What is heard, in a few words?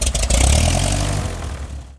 start, cessna; gmod; lfs luna s flight school